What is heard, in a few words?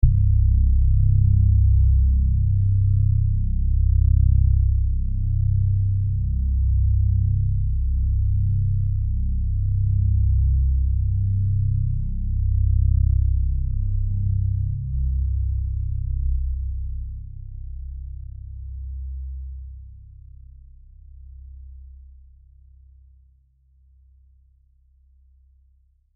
Deep Drone, Deep ominous bass drone transition; Drones; Sound Design